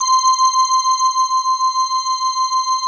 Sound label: PIPER VIBE C6; Greytsounds; Scott Peers Sound Engineering